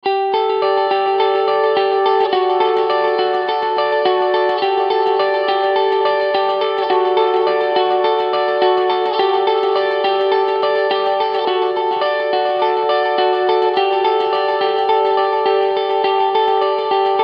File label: guitar verse